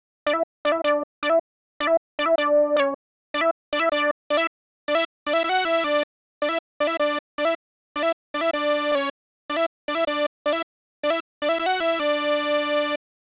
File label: Lead Mini V